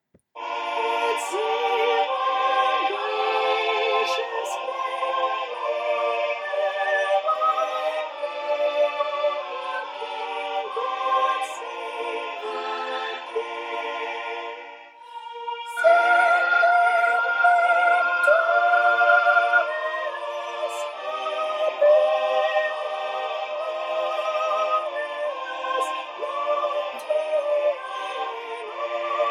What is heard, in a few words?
Soprano; God Save the King; Voices of Remembrance; Folk Choir music